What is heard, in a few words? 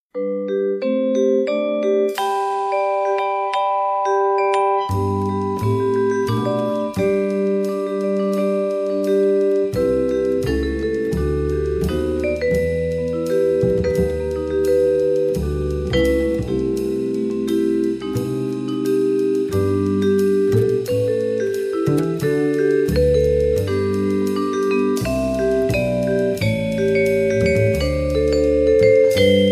Vibraphone